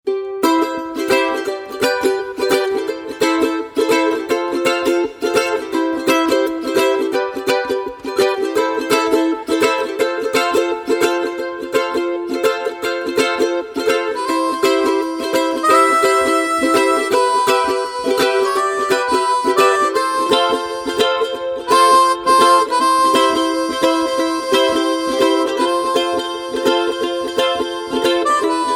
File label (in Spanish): Charango armonica